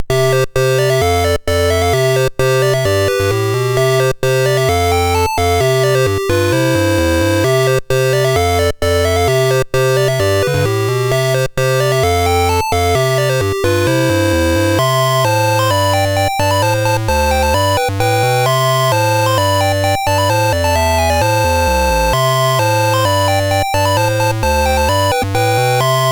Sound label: Dragonworld sid MOS6581R4